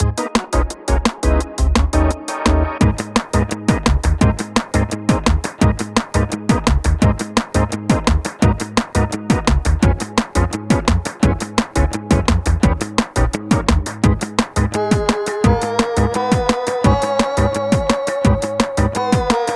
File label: battle; RPG Maker; Grimm's Hollow